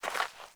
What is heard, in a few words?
Fawn Footstep, Foley; Animals; Ambient